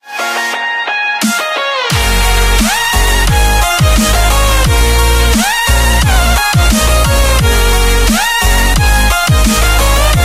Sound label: End Level; dashy square